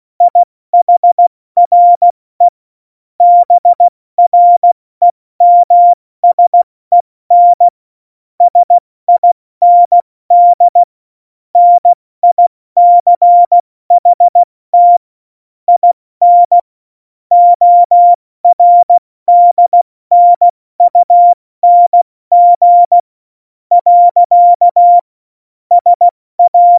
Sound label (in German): Witz, Morsekurs, 70 F80